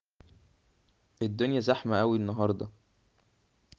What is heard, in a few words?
traffic